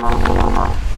die; mahlendur

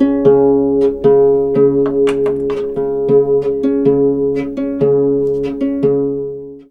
harp

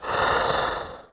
hurt

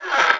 LEVER

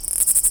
WALK

